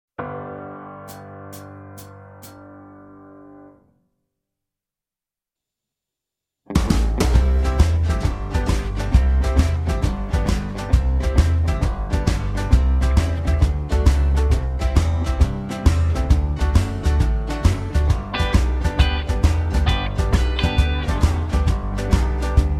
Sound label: (G) No Backing Vocals